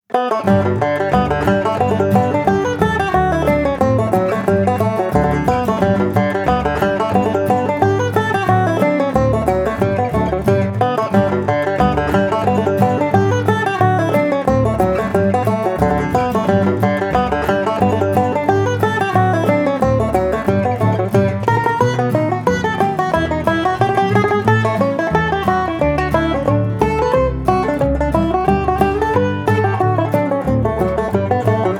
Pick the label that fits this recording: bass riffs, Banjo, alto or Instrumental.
Banjo